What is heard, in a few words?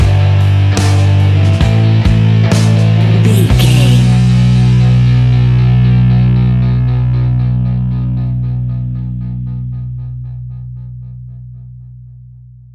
old 70s vintage rock ballad stinger; Old Seventies Vintage Rock Ballad Stinger